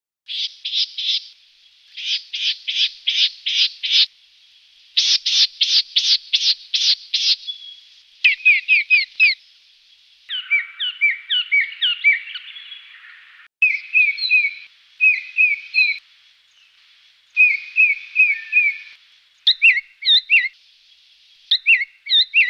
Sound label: white Naped Honeyeater; honeyeaters; canberra garden birds